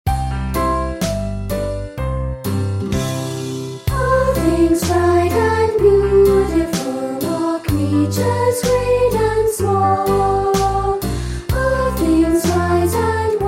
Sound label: Childrens Choir